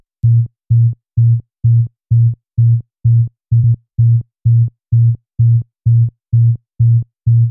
128BPM Bass Loops A#; Tuned; MELBOURNE DROP VOL. 4

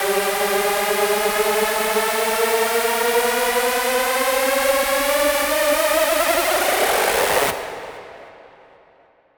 128BPM Spring Brake Kit, Future Big Room; Shockwave(PIL) - Future Big Room vol 1

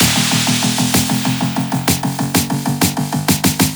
128BPM Fills (2 bar), EDM; Shockwave; ESSENTIAL EDM FILLS VOL. 1

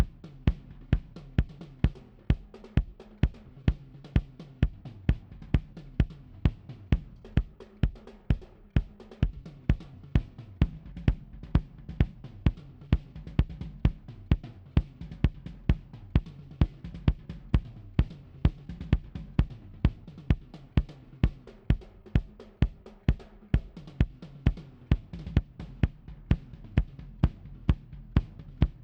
Drums Kick Coda

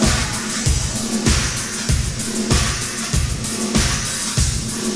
Space Drums Loop

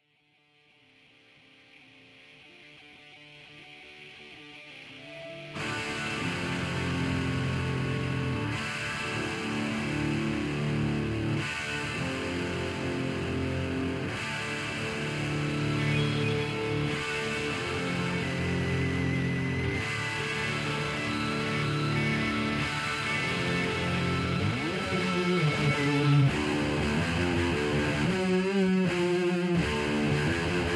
guitar

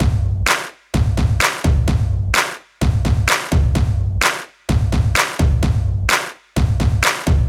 Toms Claps

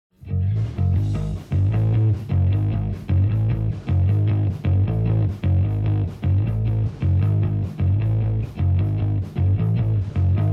rhythm

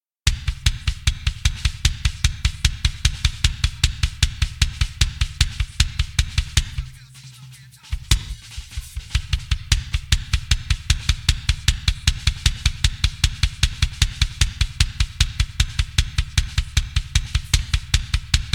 drums

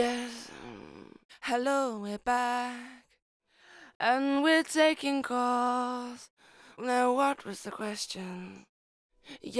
Lead Vocal Verse III; Multitrack

